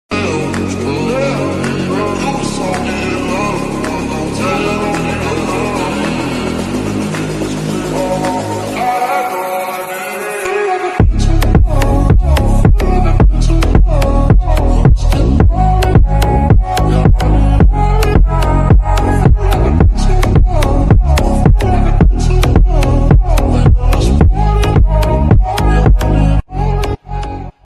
instrumental background ringtone

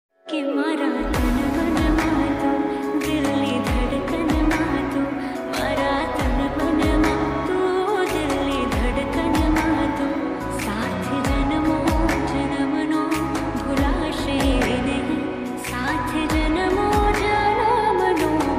gujarati ringtone

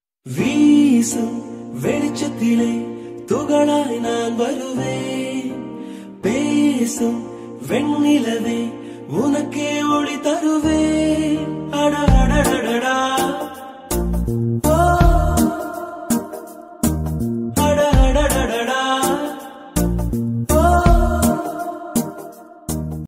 tamil ringtone

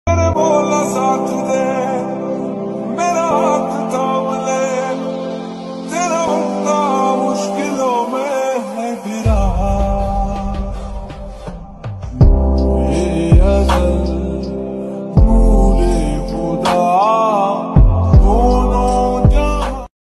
lofi remix islamic ringtone

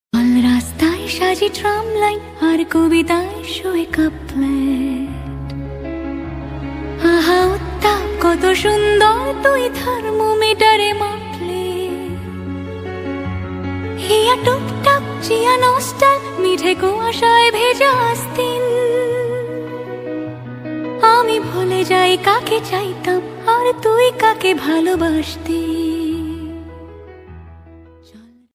bengali ringtone

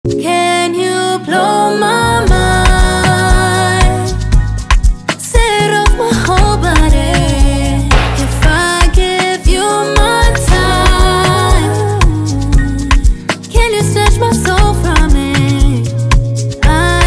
english ringtone

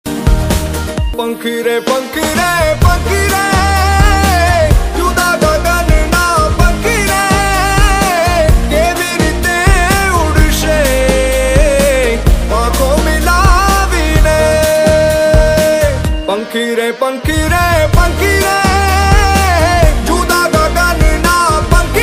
gujarati ringtone

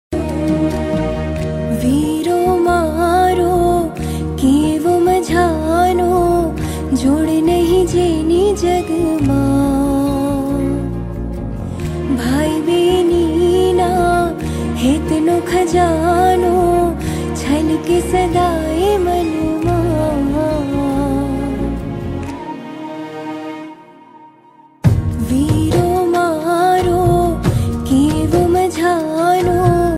gujarati ringtone

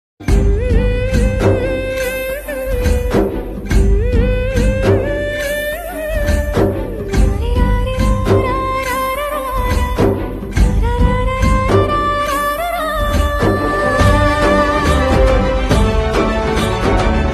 Background music ringtone

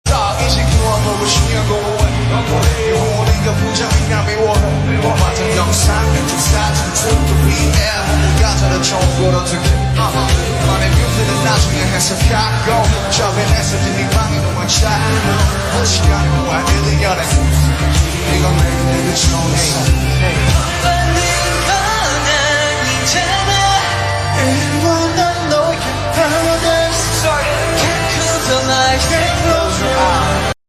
korean ringtone

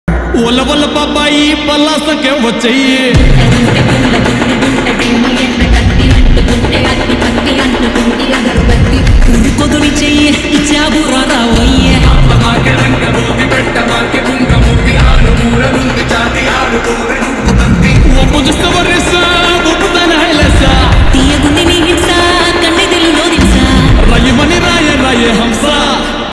telugu ringtone

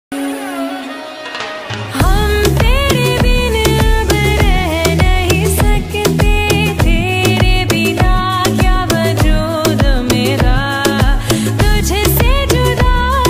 hindi ringtone

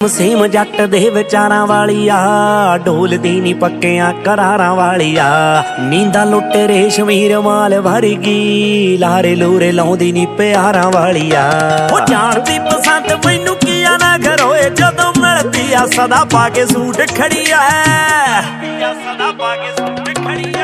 punjabi ringtone

